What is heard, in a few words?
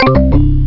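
jazz loop; OctaMED SoundStudio V1; jazzies samples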